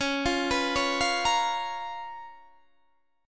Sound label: Db 13th Db; arpeggiated